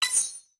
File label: Puzzle Game Charm Magic